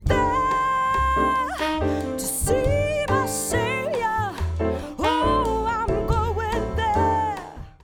Vox Meld Inv

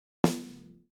Snare Comp Slow Att Slow Rel

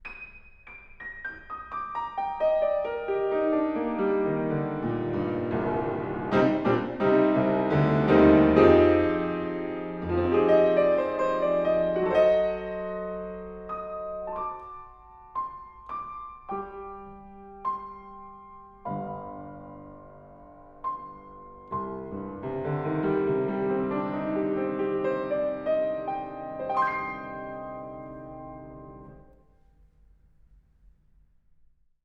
Piano, Horiz Off Mic

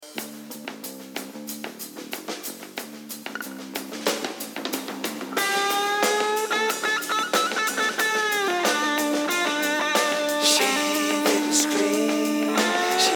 250Hz HPF

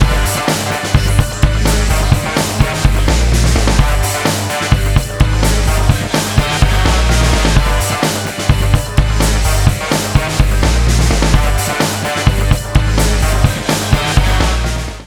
Mix Kick Ducker

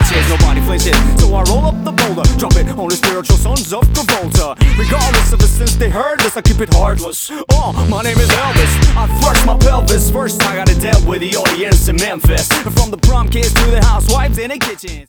Harp

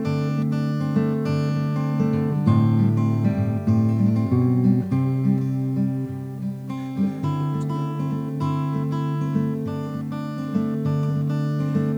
Ac Gtr Boom Auto EQ

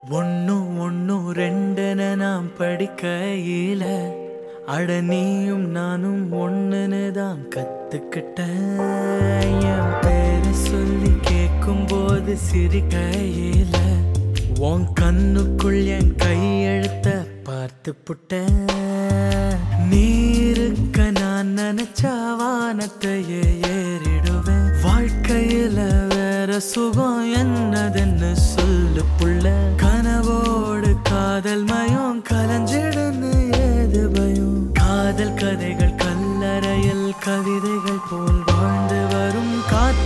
Auto Fader EQ Out

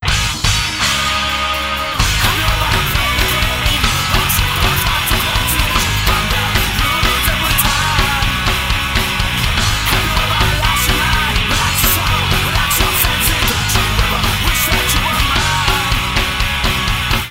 Mixed Too Quiet

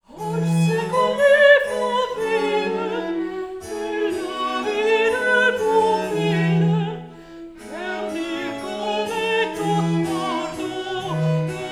Spots Delay Mix Spot 20ms Delay Reverb

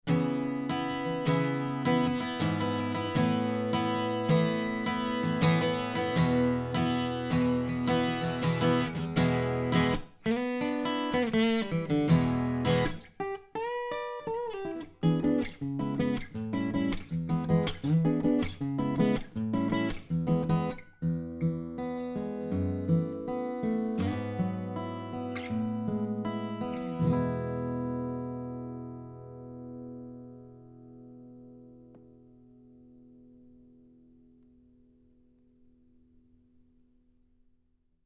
Ac Gtr Gtr Under Tail

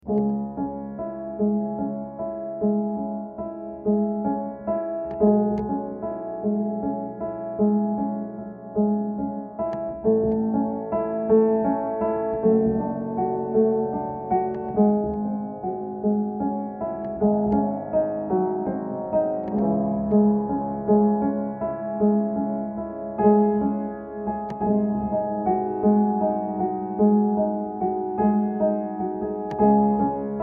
Resonators Piano Piano Case Stereo

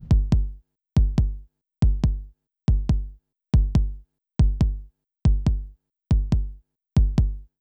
Kick Lag Proc

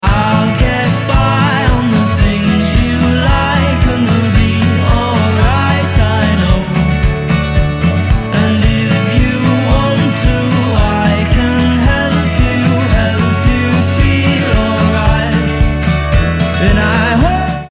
Extra Bass Out

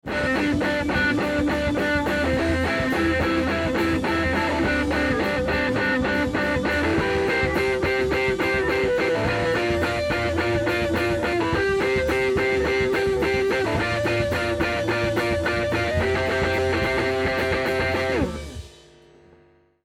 Baffles2 Guitar Duvet

